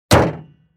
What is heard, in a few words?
Transportation Cars Honda Civic Close Hood